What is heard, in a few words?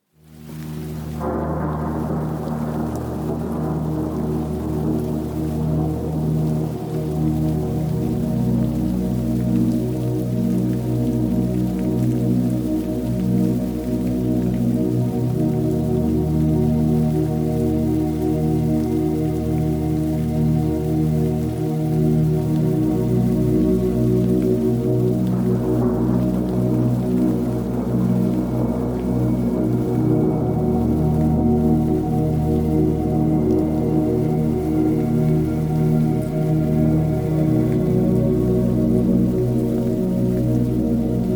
DEEP SLEEP DELTA WAVE THUNDER; Delta wave Deep Sleep Music; frekvencí Delta